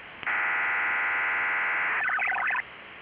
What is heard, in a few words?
3000 8FSK